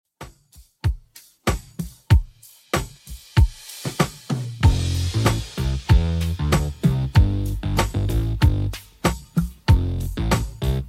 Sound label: 70s Redrum 95bpm